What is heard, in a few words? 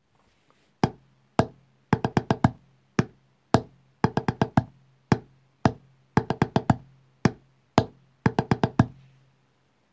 rhythm